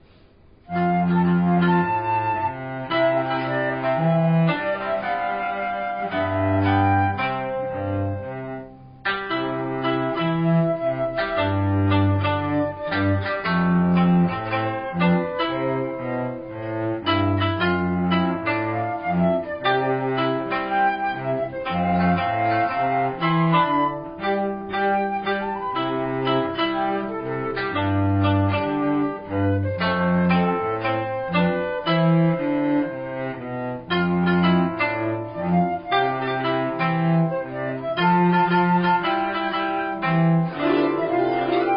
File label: karaoke